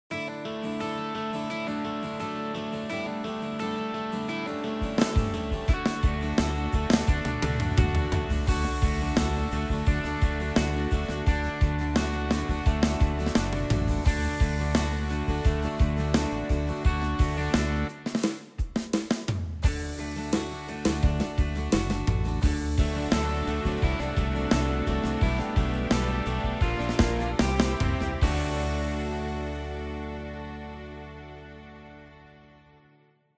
Pop Samp; Kaos

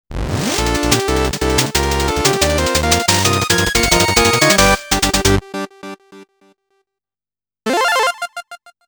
YOU WIN; No More Heroes

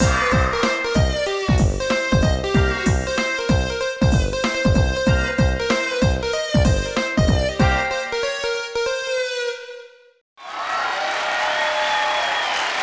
BGM Club Success; tokimeki memorial girls side 4th heart; Scenario

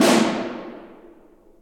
Snare

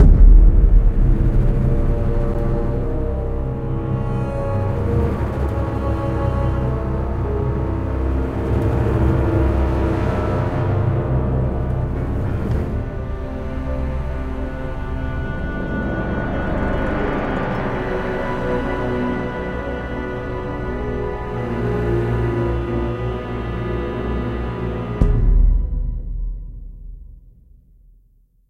Tense Sting; jurassic park the game android ios ps3 windows xbox 360 gamerip 2011

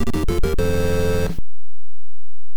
Bonus; Kirby's Adventure

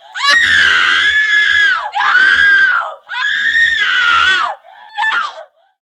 Wife Scream; Prison Architect

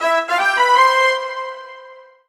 Orchestral Brass Positive; MUSIC EFFECT; startide

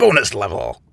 bon bonus level; pirates of the atlantic windows gamerip 2006

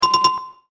Bell Sound; Skjut Platta; granny chapter two; android

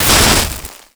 candle

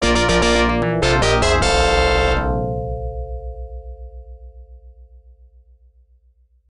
Unused Jingle; Ghosts n Goblins; Arcade

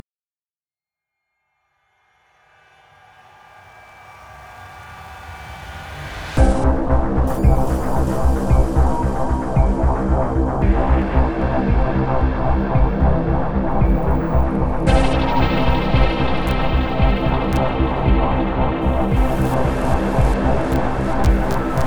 menu Scraping Blades (Stealth Moving); Devil Guns Demon Bullet Hell Arena

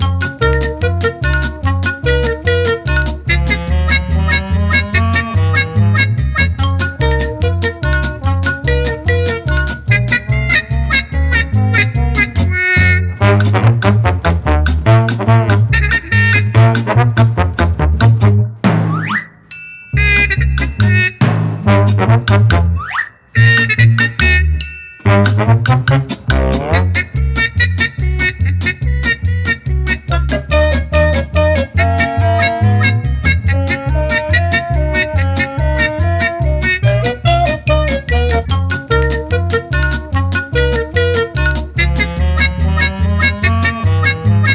bug catcher music

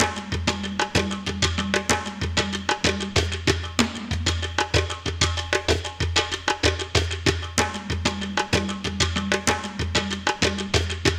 Close combat Loop

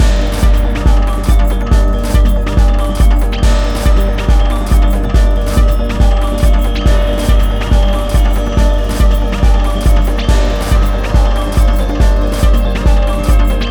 Gamelan techno Medium; rive wreck hack die retry; ps4

